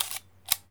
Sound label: Camera